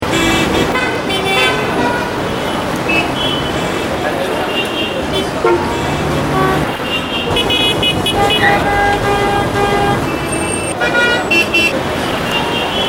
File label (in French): Improvisation klaxophonique